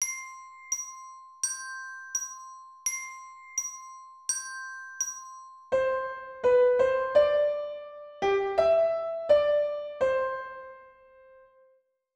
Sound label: Melody 4-4 C Major